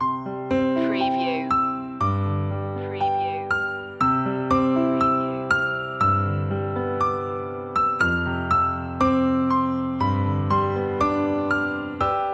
o come all ye faithful piano loop; media music now

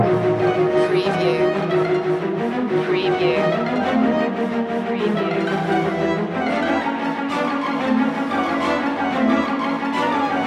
halloween loop

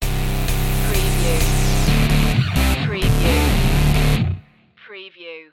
80s revenge stinger

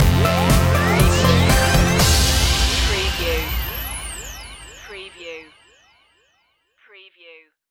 polygon stinger

